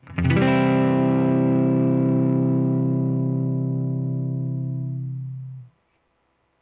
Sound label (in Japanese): メジャートライ